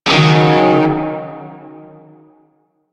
Shawzin Yo Chord; Warframe; Narmer Shawzin

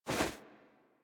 Movement Foley; Warframe; Wisp

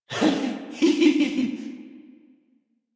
Laugh; Warframe; Alad V Boss; en

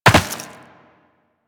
Land Rock, Footsteps; Warframe; Tenno

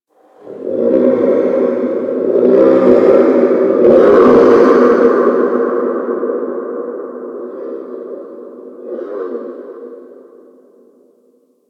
Ice Creature Distant Cry; Ambience; Warframe; Corpus Ice Planet

